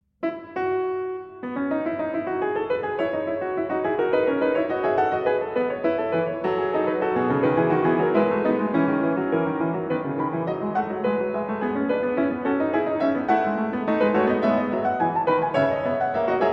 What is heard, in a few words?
Prelude & Fugue in E Major, BWV 854 II. Fugue